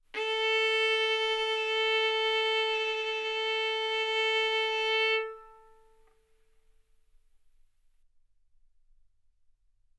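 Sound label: Violin arco mf A string A4 B4 stereo; sul A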